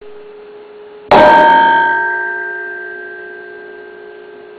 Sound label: Piping With Usual Damping